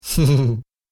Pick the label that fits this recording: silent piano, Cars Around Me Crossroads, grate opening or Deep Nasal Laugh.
Deep Nasal Laugh